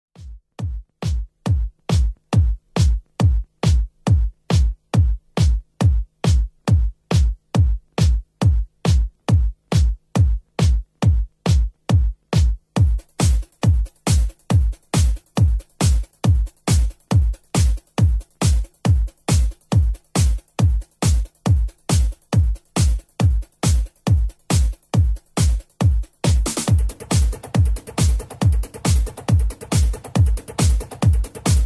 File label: classic trance mix